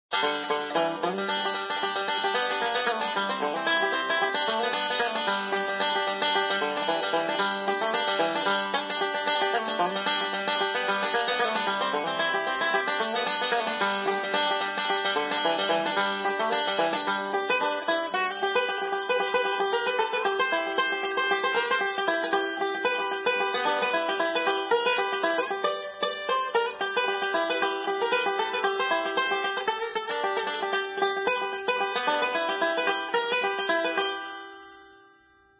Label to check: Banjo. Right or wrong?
Right